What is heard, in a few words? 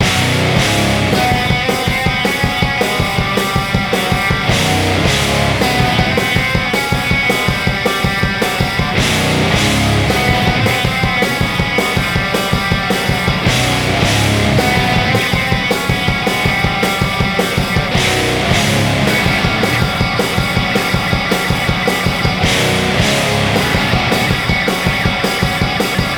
noise rawk sludge; the hack; weeklybeats 2014